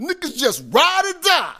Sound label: Rap Vox